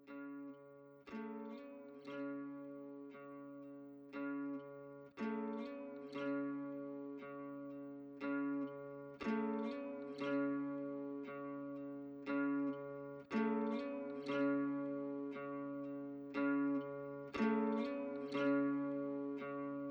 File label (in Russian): grustnaya muzika